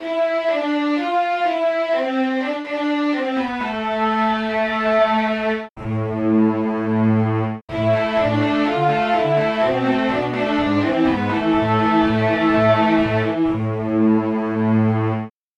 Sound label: strings